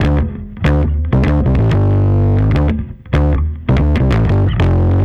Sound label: Bass, 95bpm, D, analogue circuit; musicradar